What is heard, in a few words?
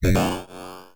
Access Virus B; Synthworx